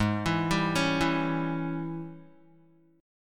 Ab Major 7th Suspended 4th Sharp 5th Ab down Guitar; arpeggiated; JGuitar